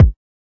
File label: kik